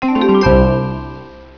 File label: next level; Short Circuit